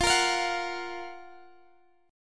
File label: F sharp Major 7th